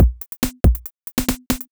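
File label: DRUMLOOP ELECTRO 140; Techno2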